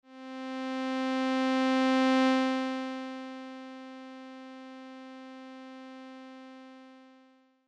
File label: ADSR; synthese sonore les enveloppes